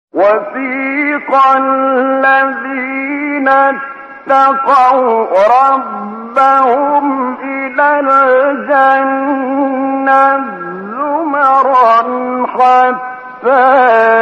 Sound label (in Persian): تلاوت زیبای آیه سوره زمر; آیه 73; استاد طبلاوی